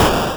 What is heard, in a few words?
shotgun, weapons